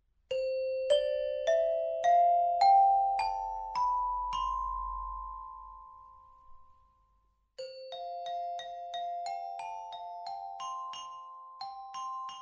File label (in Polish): cymbalki na tubach